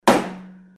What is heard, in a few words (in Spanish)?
golpe; ruidos